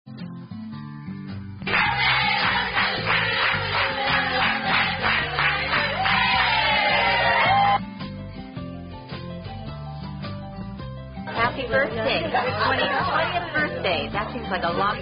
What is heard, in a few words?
Happy Happy Birthday; Happy Birthday Irish; Chili's